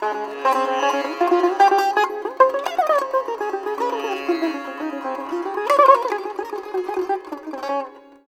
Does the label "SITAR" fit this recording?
Yes